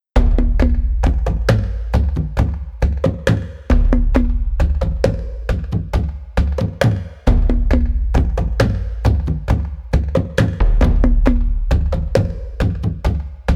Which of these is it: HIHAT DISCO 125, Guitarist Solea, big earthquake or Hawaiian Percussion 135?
Hawaiian Percussion 135